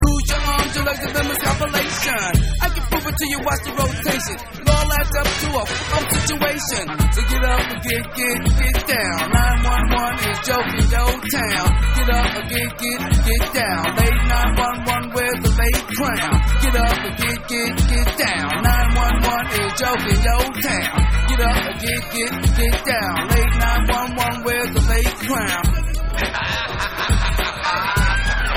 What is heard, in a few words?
Vocal